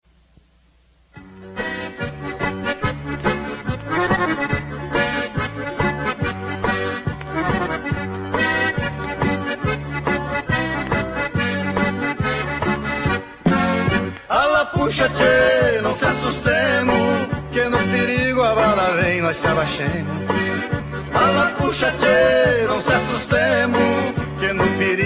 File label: forro